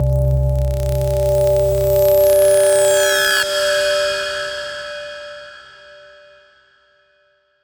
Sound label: Frozen Sweep 140 BPM, Psytrance; Digi Box